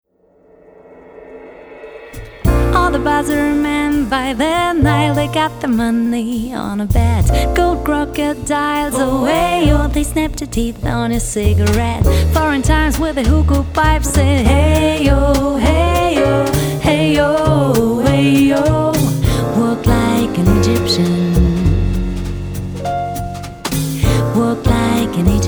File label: classy swing ensemble